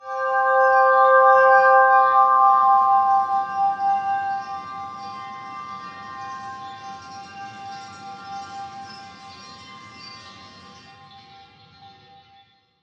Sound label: RING WET CHIME; eShop